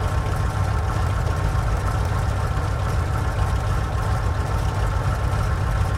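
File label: zero cockpit left, engines; War Thunder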